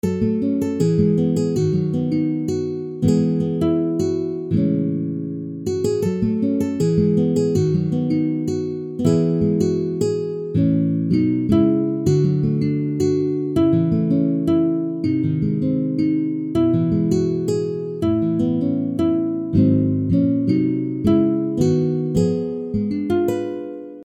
guitare

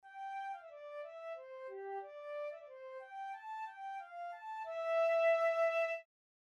flute temps double; borodine

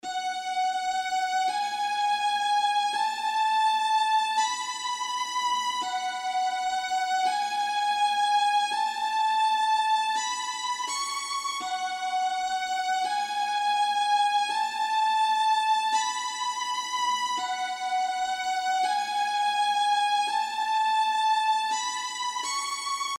violons

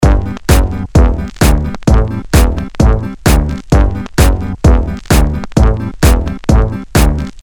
guit bass tuba rythm

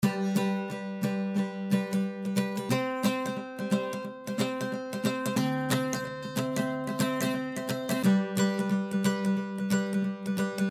guitare

